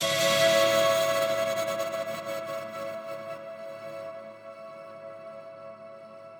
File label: Pretty Hit A Maj 150; fx; base pack